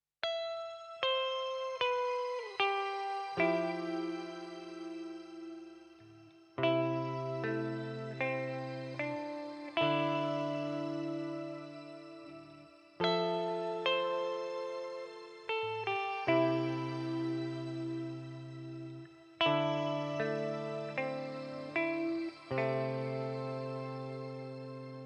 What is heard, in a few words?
Shimmer Reverb Neunaber Immerse Reverberator MKII